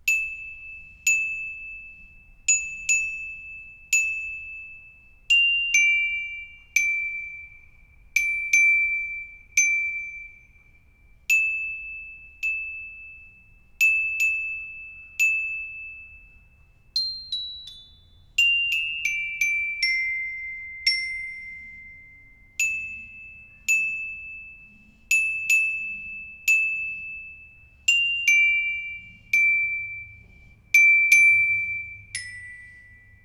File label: glockenspiel